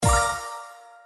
EFX LAND SCATTER, slots; Monkey Spin

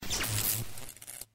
right sparks

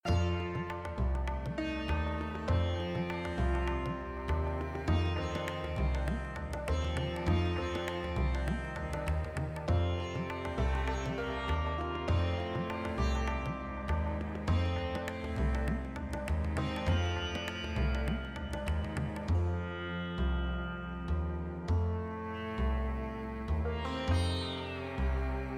BGM Normal; slots; Majestic India

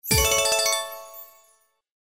SFX Symbol Wild Win, SLOTS; Premier Night

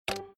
Spin Stop; Wealth Gods

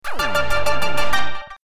Symbol Win Jackpot; The Lucky Fairy

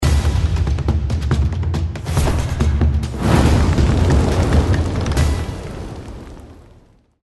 dragon Animation; Sicbo

